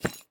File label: crystal walk; blocks; step